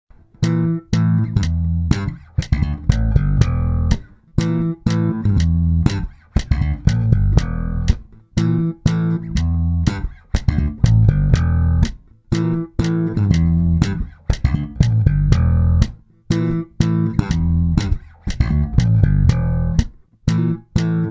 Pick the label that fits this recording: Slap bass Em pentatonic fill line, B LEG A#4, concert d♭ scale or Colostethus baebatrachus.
Slap bass Em pentatonic fill line